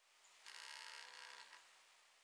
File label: wood strain, Night; FontainebleauDemo